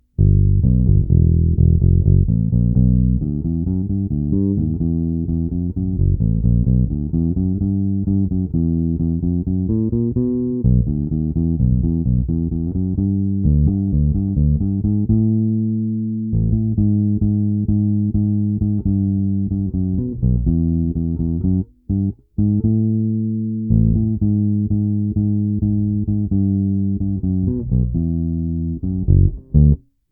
Jay Turser Precision bass, La Bella - clona 100%; JTB-400C-BK-A-U

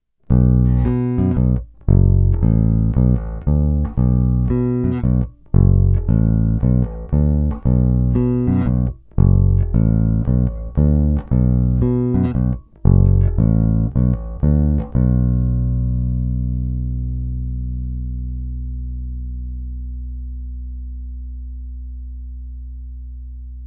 Fender Blacktop Precision Bass, Snímač u krku SC

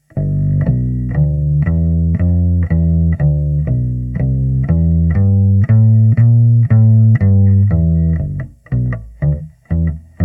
Elektrický kontrabas - IR simulace (pro kontrabas); Aria SWB Lite; NUX NPB-5 Melvin Lee Davis Bass Preamp & D.I. Box

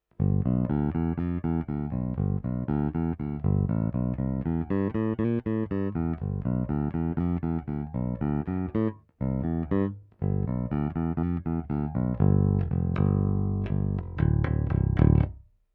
S-x prsty, poniklovaná ocel, Roundwoundy, Schecter SLS Elite-5 baskytara; D'Addario NYXL 45 - 130; aktivní pouze snímač u krku, v jednocívkovém zapojení; zvukový mód classic; hra prsty

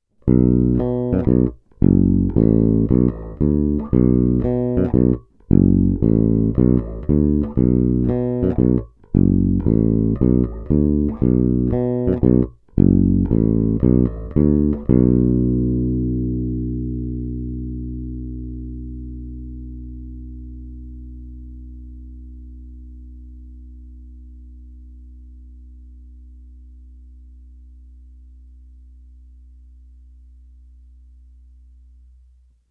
Snímač u kobylky (RW), Mike Lull V4, baskytara

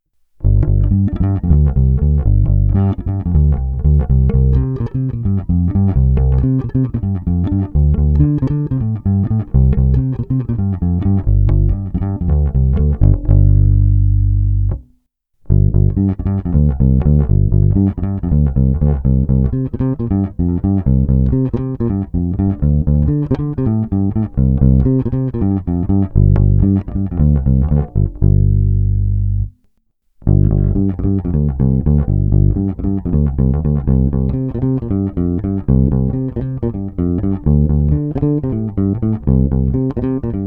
Hráno mezi snímačem a kobylkou RW, Lakland 44-64, Baskytara; Bob Glaub

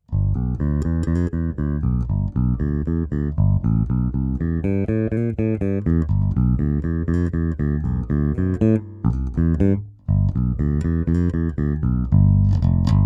Voice: modern, snímače H - H, Schecter SLS Elite-5 baskytara

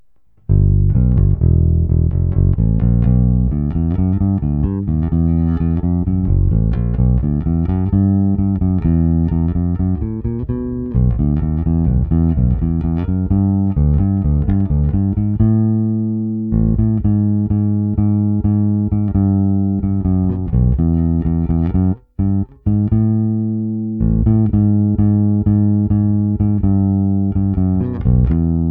Jay Turser Precision bass, clona cca 50%; JTB-400C-BK-A-U

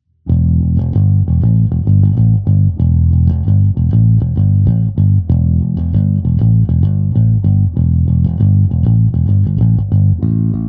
Greco TB-750 Thunderbird Bass, Trsátkem na oba snímače se simulací aparátu; Overloud TH3; zařadil jsem i zkreslení; Je to vždy namícháno i s čistým neefektovaným zvukem

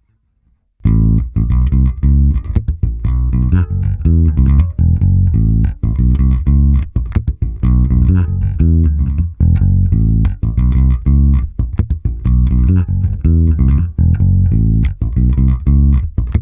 Nahrávka přes Darkglass (FW), Mike Lull V4, baskytara, V ukázce je použito i zkreslení a slap. Hráno s oběma snímači naplno a plně otevřenou tónovou clonou.; Microtubes X Ultra se zapnutou simulací aparátu

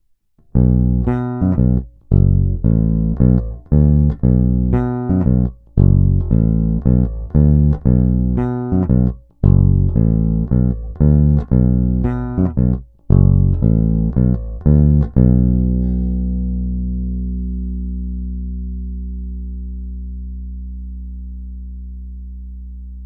Greco TB-750 Thunderbird Bass, Snímač u krku; niklované Elixiry; Hráno vždy nad aktivním snímačem

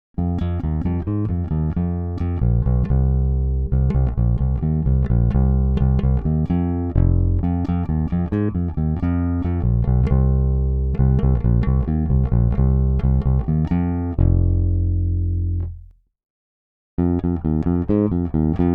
Bonusová ukázka; Fender Custom Shop Pino Palladino Signature Precision Bass

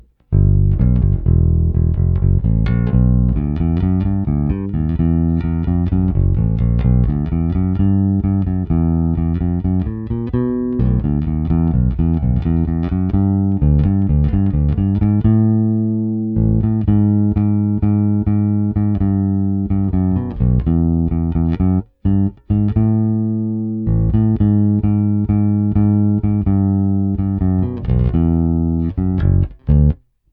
Jay Turser Precision bass, clona 0%, Flatwound struny; JTB-400C-BK-A-U; Fender 9050ML 50-100; Korekce na předzesilovači jsou ve všech případech rovně

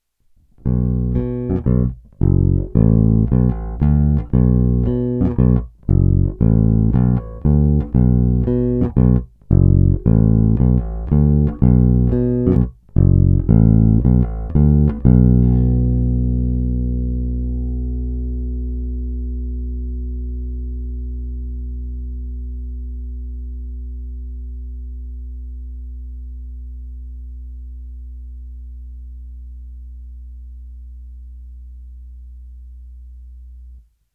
Hráno mezi snímačem a kobylkou FW, Lakland 44-64, Baskytara; Bob Glaub

